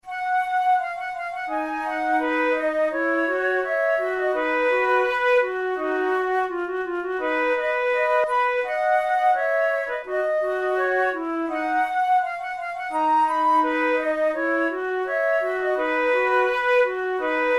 methode de flute; tulou

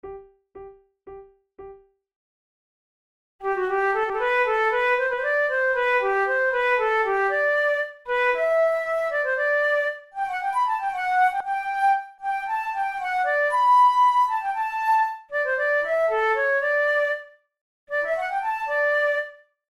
vivace, flute tunes; loeillet de gant op5 no3 i

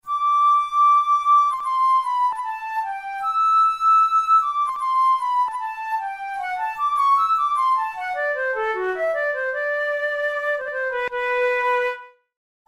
studies for the boehm flute

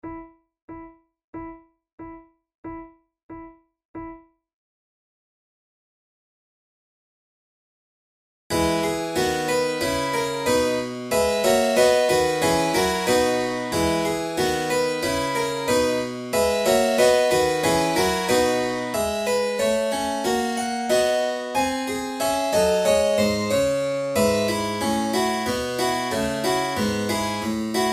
violin sonata gavotta; corelli

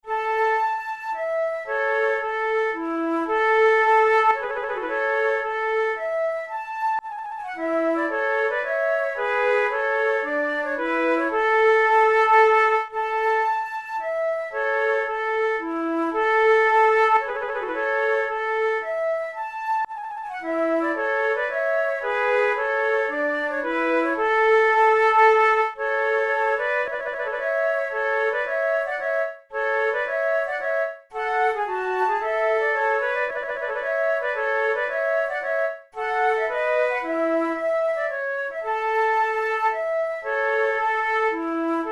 two flutes musette; Monteclair; 6 concerts; flute tunes

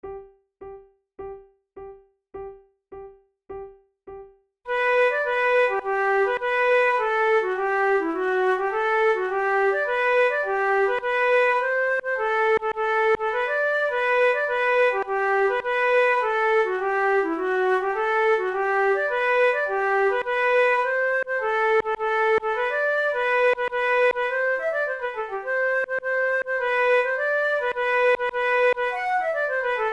duet, flute

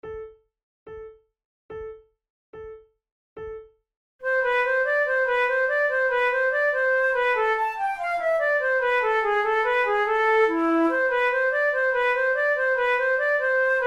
gavotte en rondeau, flute

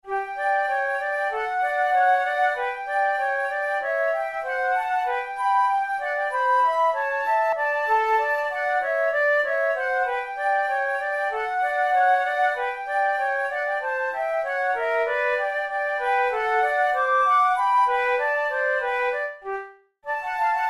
flute; school of flute grade1

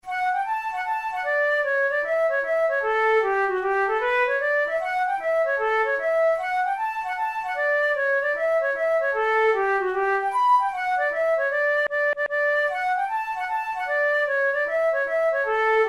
hornpipe, flute

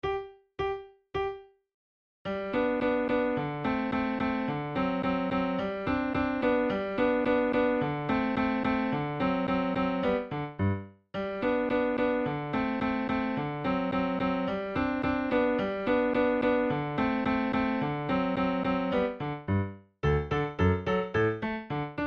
ecossaise in g major, flute

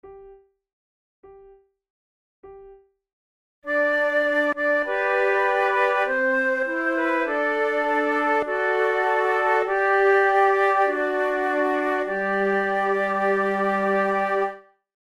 quartet, flute tunes; O felice o chiara notte

